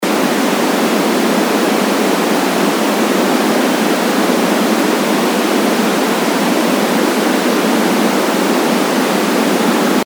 brownnoise

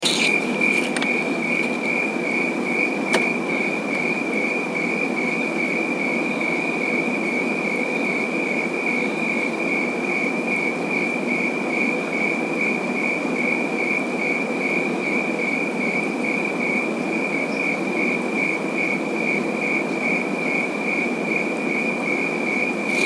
crickets 68.1F

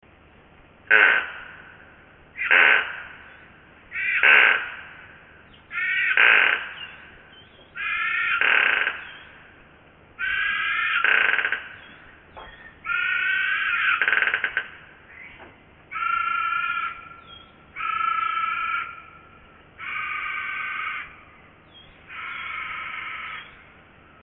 tree hyrax, LOWPASS 3100

